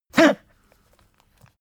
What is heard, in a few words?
pug gag smack medium; natur-tiere; hunde